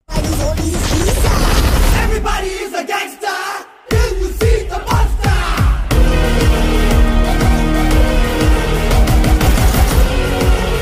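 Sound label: background music ringtone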